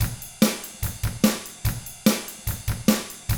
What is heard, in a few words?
rock drums